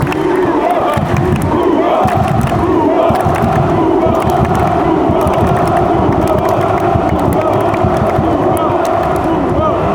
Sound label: dortmund chant